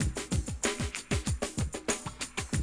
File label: Classic DrumLoops